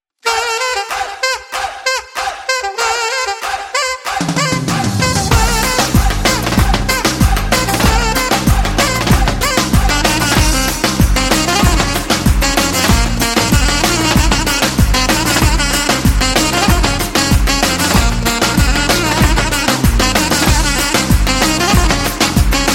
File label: arabic dance